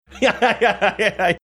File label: funny laugh